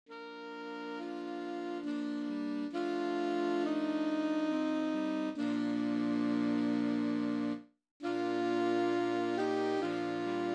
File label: Silent Night, saxophone quartet; christmas carols saxophone quartet volume 1